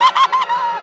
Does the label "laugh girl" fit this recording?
Yes